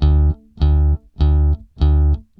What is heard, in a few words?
BASS, ROCK POP; MAGIX